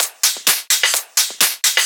Discohouse Loop 128 BPM

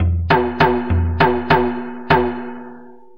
Madal, Acoustic Beats; Sonic Foundry (Sony Creative Software); Myths of Technology

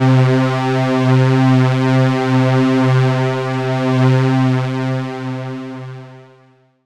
synth c, Synth Atmos (pad); Chillout

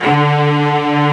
Section String D2; Proteus 2000; Digital Sound Factory Proteus 2000

